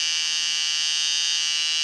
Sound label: loop machine